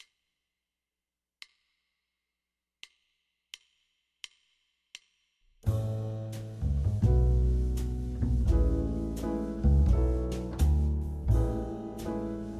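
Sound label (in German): A-Dur klingend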